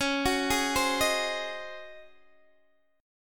C sharp Major 7th Suspended 2nd Suspended 4th C sharp, Guitar DADGAD; arpeggiated